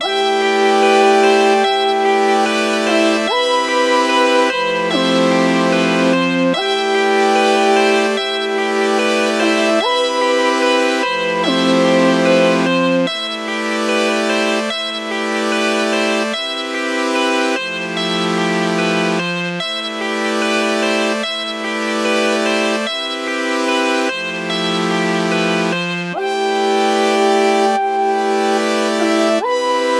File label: boomboom 147 Am